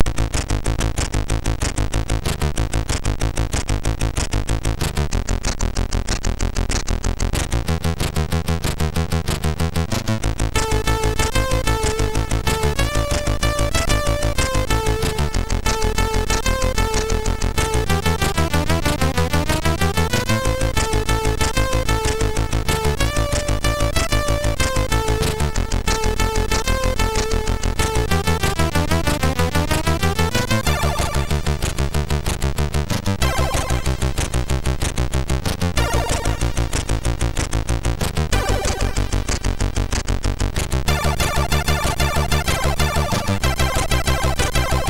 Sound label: sid, MOS6581R2